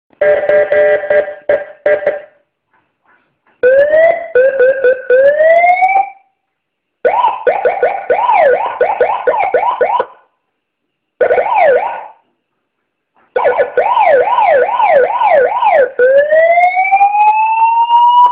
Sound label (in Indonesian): Sirene Polisi